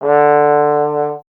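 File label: Brass Trombone D; Miroslav Vitous - Solo Instruments VOL-1; 90 s Sample CDs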